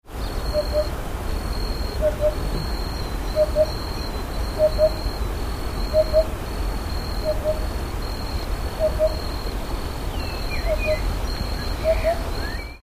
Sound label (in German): wiedehopf; Upupa epops